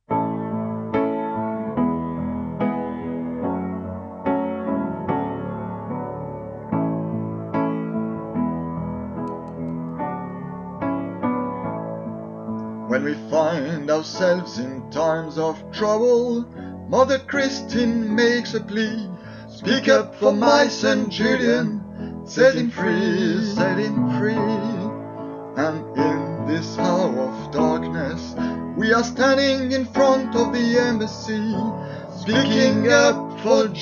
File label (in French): 2 voix, chorale; Set him free